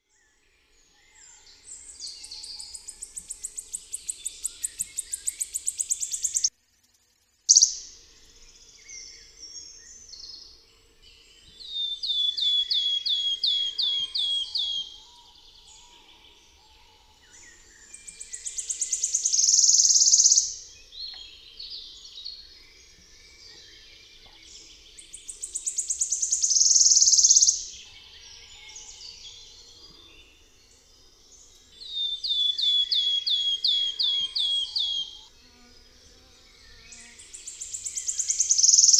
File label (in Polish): Spiewy Swistunka lesna